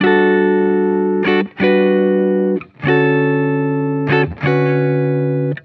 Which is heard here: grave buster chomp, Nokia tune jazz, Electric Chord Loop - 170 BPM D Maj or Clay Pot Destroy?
Electric Chord Loop - 170 BPM D Maj